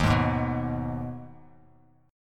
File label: Eb Suspended 4th Sharp 5th Eb Bass Standard; Ebsus4#5 strummed; JGuitar